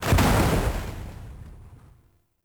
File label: Boss Explosion Transition Crash; Warframe; Caliber Chicks; minigame